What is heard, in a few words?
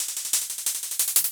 LOOP, Drum & Bass, 180 BPM